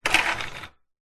household window blinds venetian wooden pull open or close; zapsplat